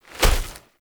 Wolf Step, Animals; Duviri

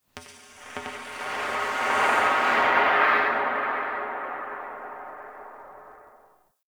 Wind Pipe One Off; Ambience; Dante Unbound; Warframe; Entrati; Dead End Secret

